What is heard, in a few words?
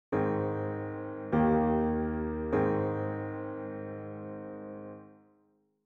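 A flat major PAC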